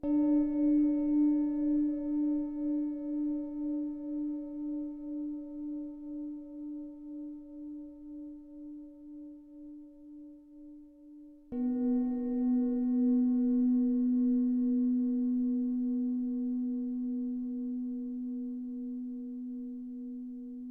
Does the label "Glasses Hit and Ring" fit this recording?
No